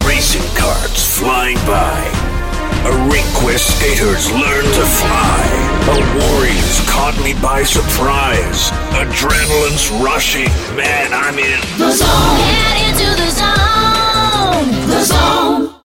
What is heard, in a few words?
Zone Jingle